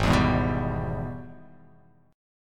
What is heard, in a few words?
G sharp Minor Major 7th Sharp 5th G sharp down